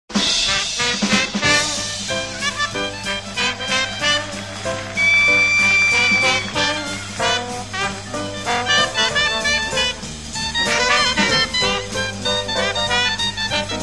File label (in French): Trompette; When the saints